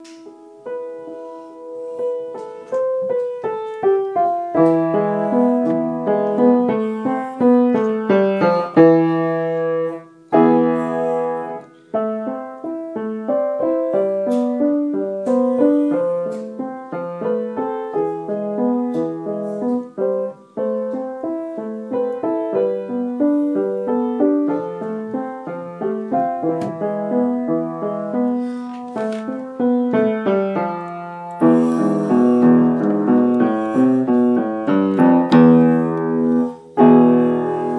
piano playing

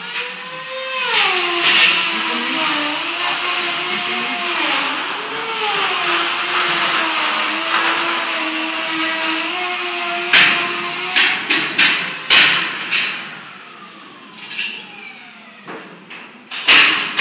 noise

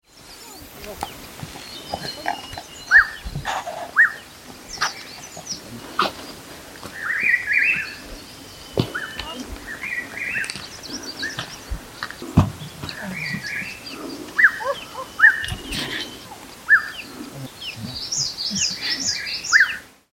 the nature sounds of doi inthanon

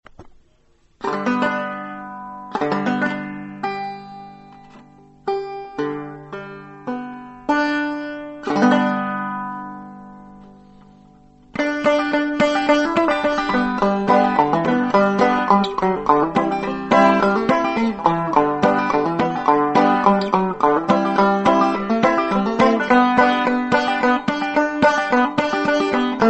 banjo; rutherford

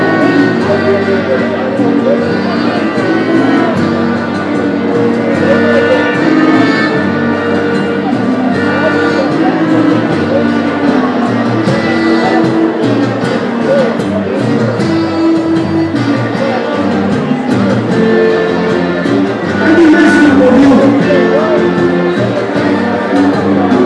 big maracas at thursday night live

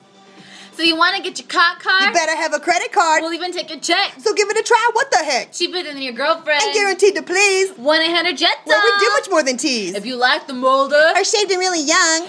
rap

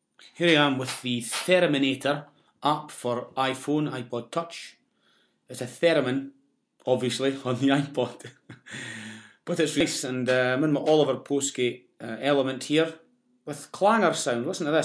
thereminator iphone app; clanger

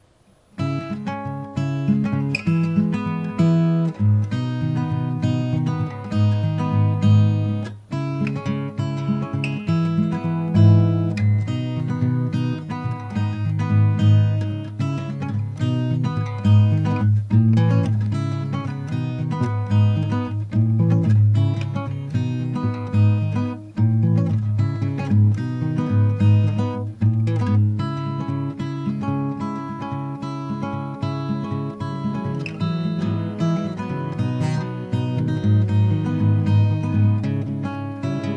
riff idea

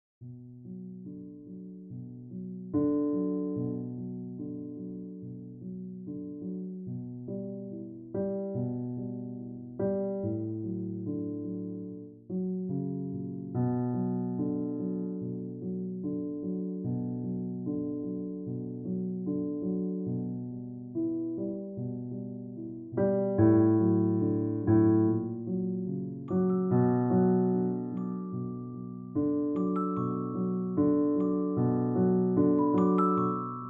playing some serious piano tune

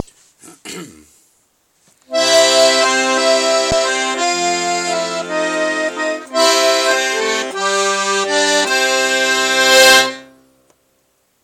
intro on my new accordian

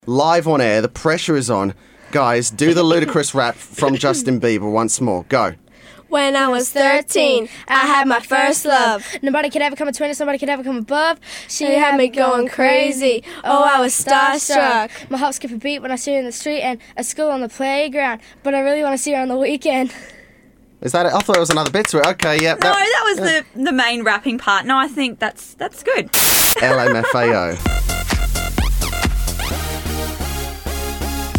some 13 year olds have a rap off

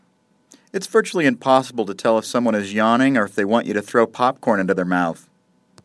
yawning

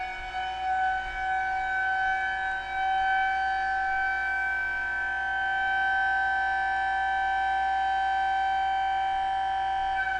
fire siren, Saugerties NY

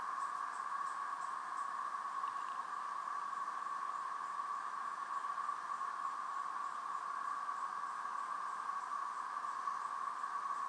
creek

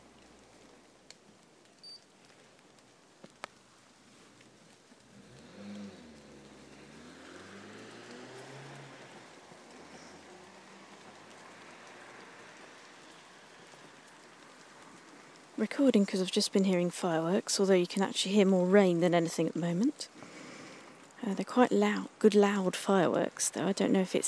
fireworks, lots of rain, a silly text alert

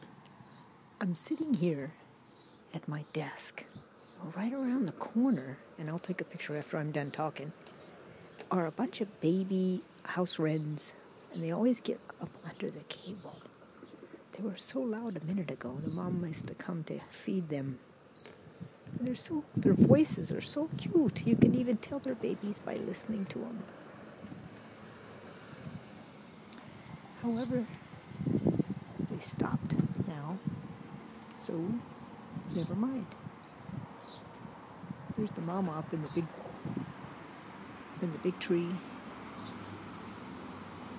birdies

